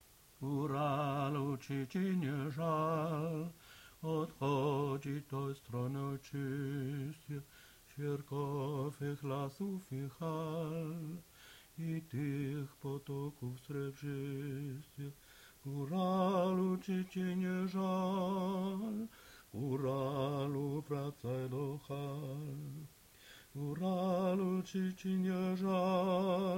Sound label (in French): voix; goralu